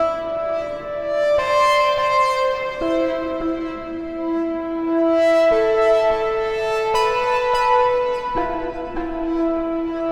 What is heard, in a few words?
Keys, Drum n Bass; KJ Sawka; Drum n Bass & Beyond